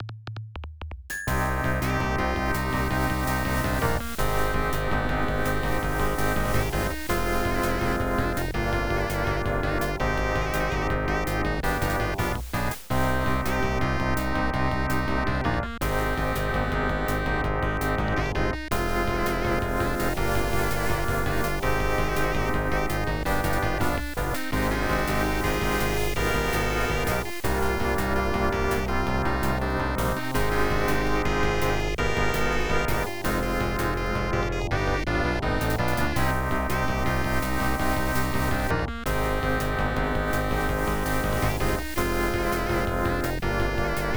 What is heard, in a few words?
Burning Gong; tokimeki memorial forever with you; ibm pc at